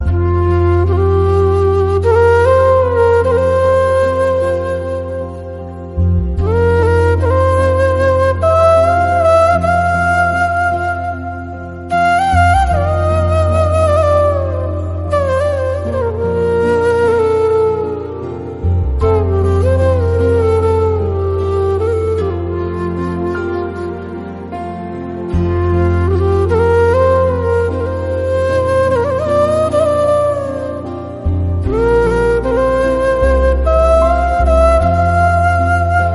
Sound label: flute, ringtone; vande mataram